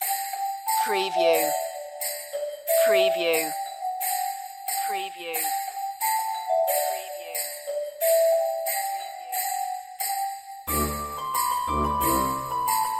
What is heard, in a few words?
on-hold music; Ding Dong Merrily On High; MediaMusicNow